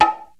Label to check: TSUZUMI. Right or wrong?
Right